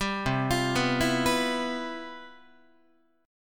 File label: C Major 11th C, Guitar 4A,4E,5A,5D,5G sharp,6C sharp; CM11 arpeggiated